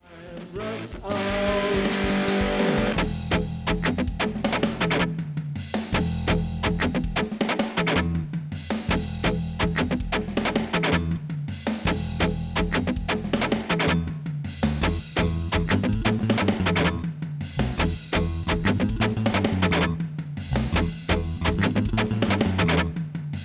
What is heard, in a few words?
solo backingtrack